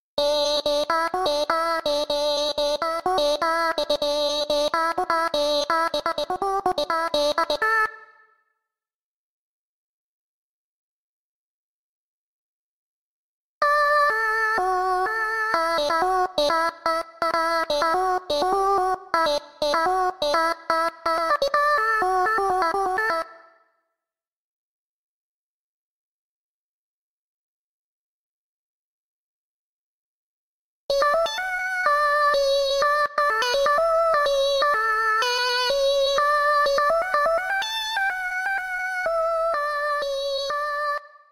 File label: Voices mom; fridaynightfunkin